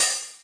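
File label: Yamaha RS7000 hihat cymbal, Drum machines; Yamaha RS7000 Cymbals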